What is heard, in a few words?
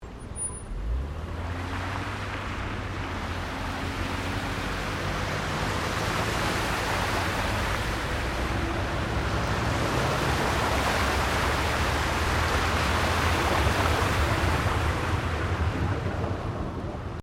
PUDDLES VEHICLES DRIVING THROUGH, Cars in the rain; Car Sound Effects